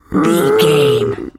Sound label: zombie voice grunt